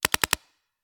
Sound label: Paintball Gun; Sound Ideas; Guns